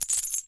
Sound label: click add bet; slotsfruitex